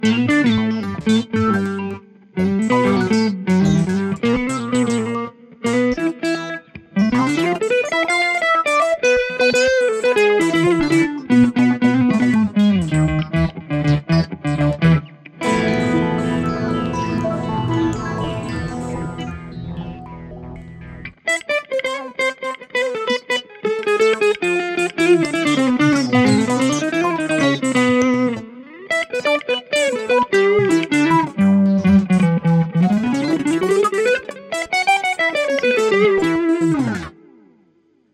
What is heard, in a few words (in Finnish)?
kitarasyna; guitarsynth